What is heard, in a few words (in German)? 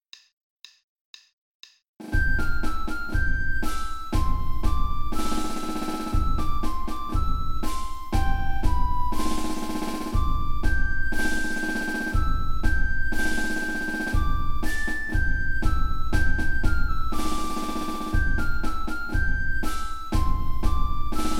Rhythmus, Spielmannszug; Froehliche Weihnacht